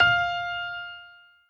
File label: piano echo